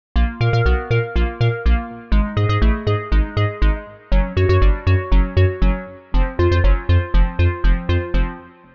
electrodes; the analog sound waves

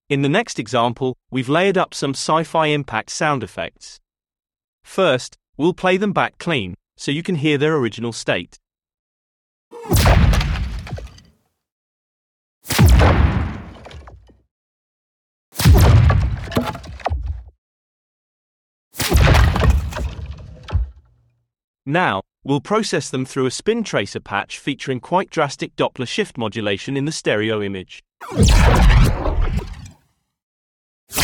sci-fi impacts stereo field; spintracer; tonsturm

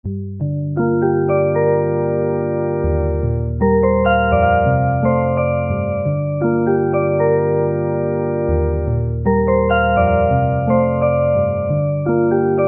electric piano; bluewave

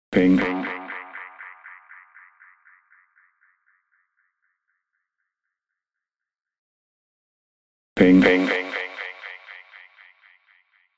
rough delay test

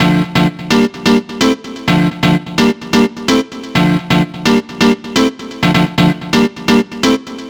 piano ivory; the producer school

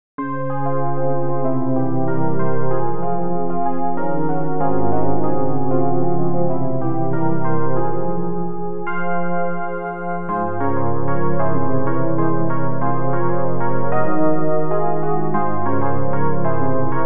prism clean; prism by warmth dev

